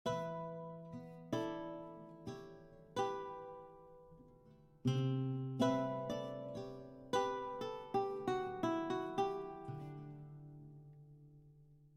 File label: baroque guitar; Quivr; PS4 Windows gamerip 2016